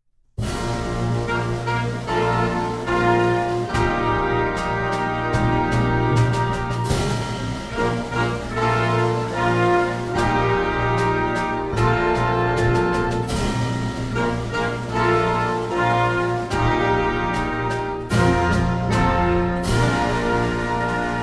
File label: Celebratory fanfare SLOW